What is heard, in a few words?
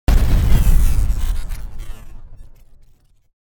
impact short; transition sound effects